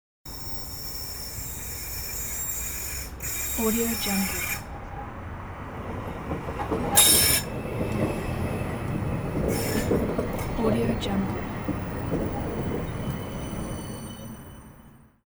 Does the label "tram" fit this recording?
Yes